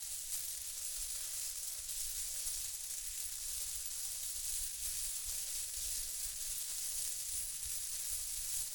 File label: new coins; netent